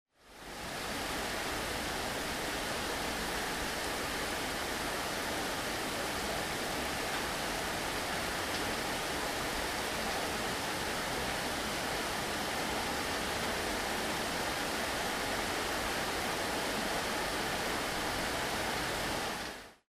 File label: the sound of tropical rain in the jungle